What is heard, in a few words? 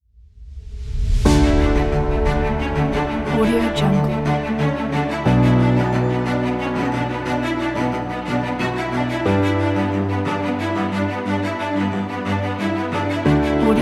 inspiring cinematic